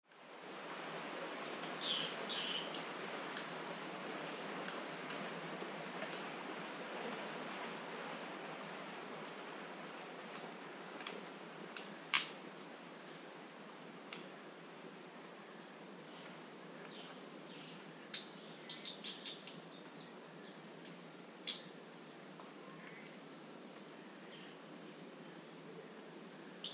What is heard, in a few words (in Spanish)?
Saltarín cabecirrojo norteño Ceratopipra mentalis, red-capped manakin